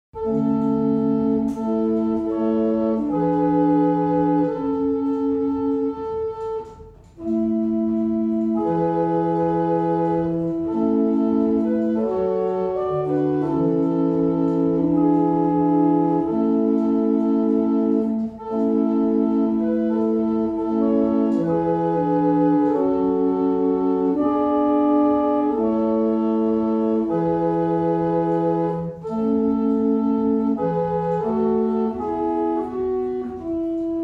barrel organs; Cardeston

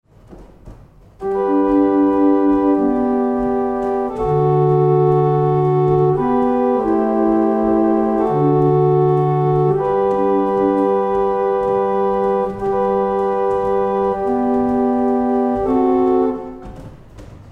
German Hymn, barrel organ; Letheringsett

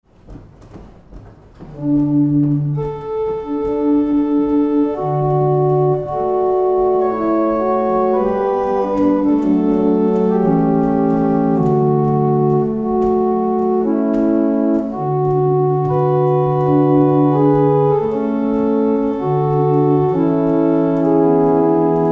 barrel organ; Letheringsett

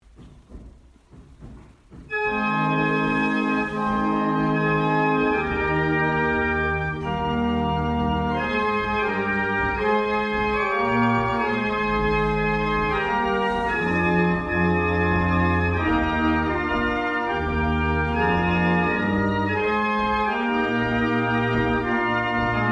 barrel organs; Brightling

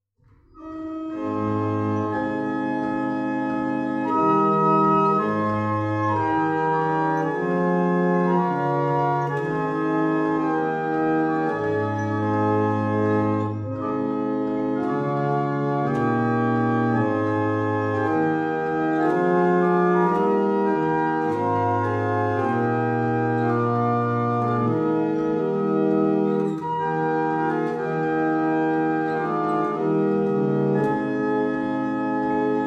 barrel organ

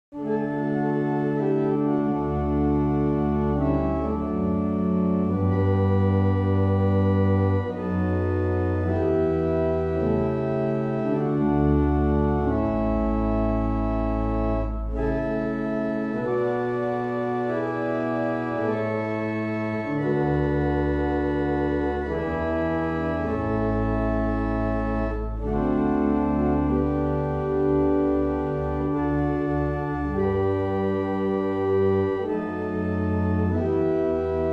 Bedford, barrel organs; Meldreth

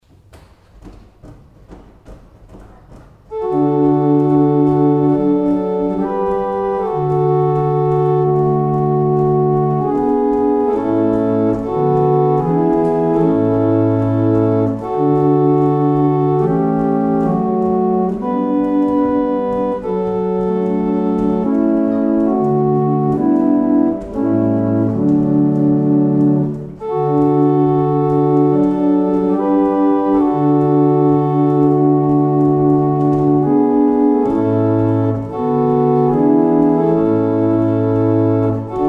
Double Chant, barrel organs; Letheringsett

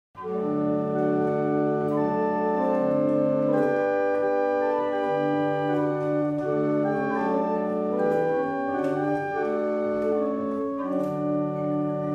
Hymn, barrel organs; Winwick; Easter Hymn

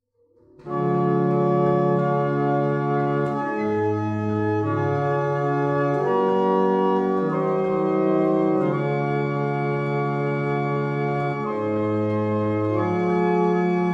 barrel organs

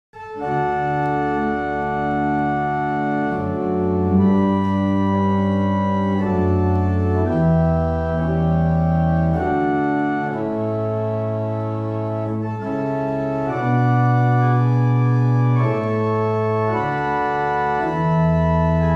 barrel organs; Easton

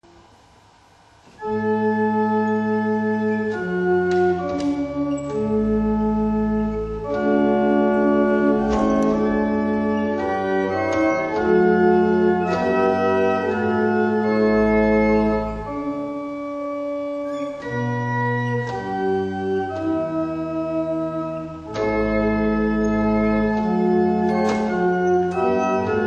Double Chant, barrel organs; Tittleshall

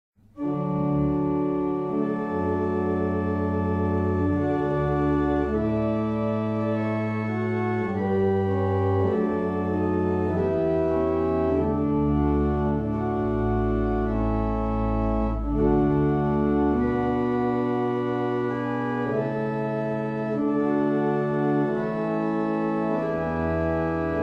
Abridge, barrel organs; Meldreth

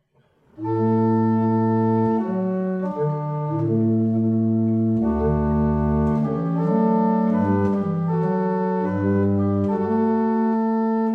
Double chant, barrel organs; Staunton

